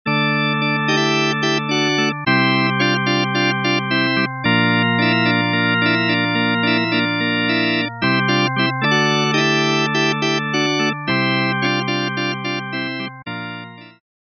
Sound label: default organ